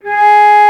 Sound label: Flute mf G#2; Digital Sound Factory Virtuoso